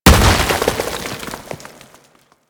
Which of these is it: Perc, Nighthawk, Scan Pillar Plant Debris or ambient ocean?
Scan Pillar Plant Debris